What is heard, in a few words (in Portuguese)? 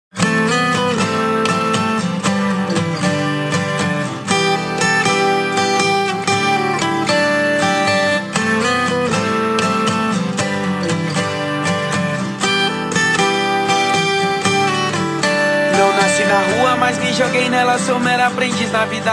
funk